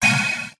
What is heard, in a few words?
Multimedia Internet CD-Rom Flash Blast